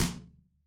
impact metal hit

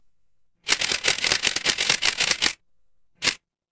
office camera

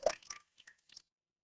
water drip

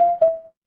ui chime

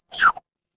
cartoon slide whistle

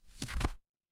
paper fabric page turn

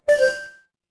ui chime

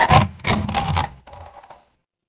door chest open

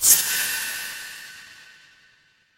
magic scifi spell

